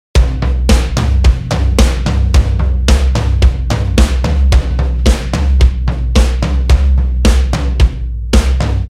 110 DRUM LOOP

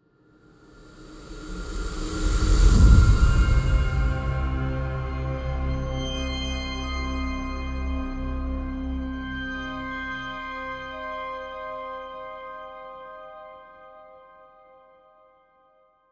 Creepy Dark Logo

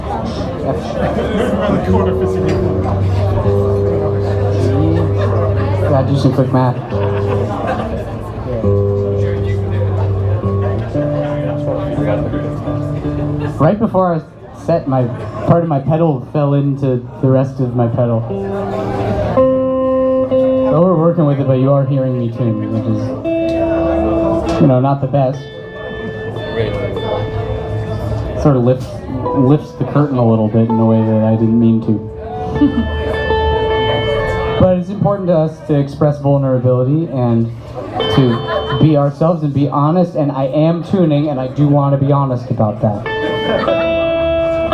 Tuning

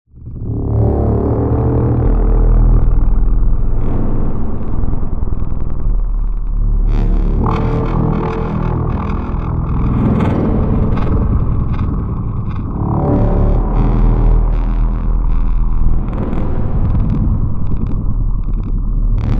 Modular Synthesis

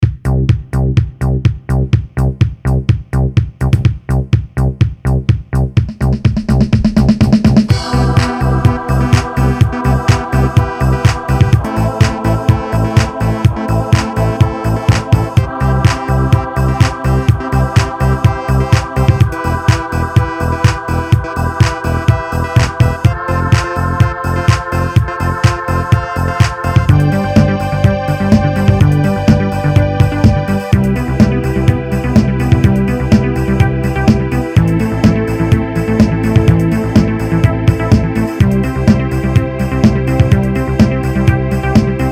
Techno Intro

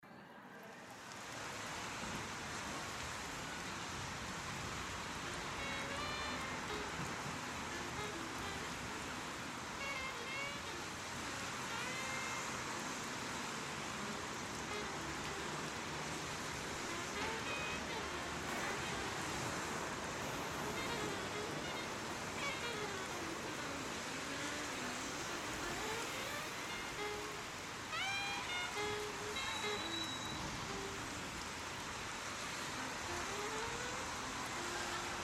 3D space train station overpass

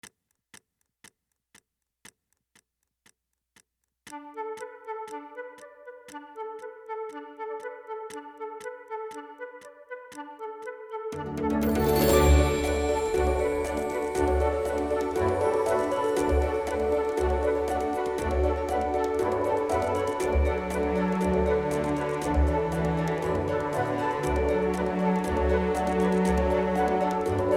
Marbles in a Bag Whimsical

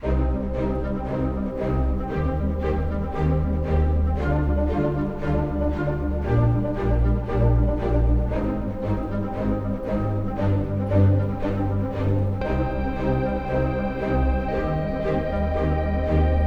orchestral bpm116; archibald editions; belgian history

